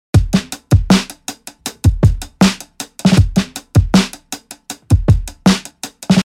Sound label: 79 DRUM LOOP SIXTEENTHS GHOSTY